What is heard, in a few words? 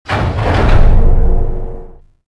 door huge start; movers